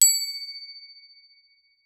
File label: Crotale ff Db7 stereo; Percussion; Crotales